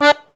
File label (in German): AKK VIOLSK, akkordeon; Best Service; Alpine Volksmusik